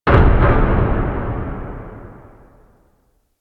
Distant Metal Bang; Ambience; Warframe; Corpus Railjack

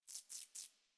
Forest Rodent Vocal Idle; Characters; Warframe

